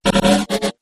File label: Hollow Vibrator